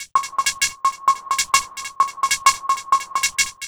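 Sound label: Percussion, Drum Loops 130bpm; Zero-G; Soundclash Nu Dance Electro; Acidized Wavs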